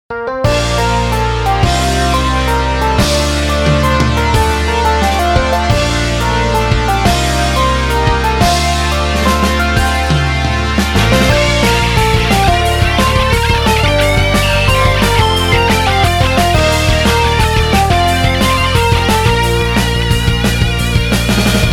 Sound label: karaoke